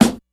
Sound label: dry acoustic snare sound A key